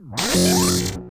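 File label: GHOST war idle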